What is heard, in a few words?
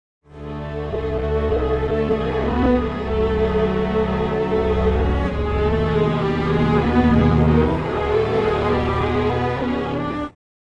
strings; timestretch; ikm sampletank stretch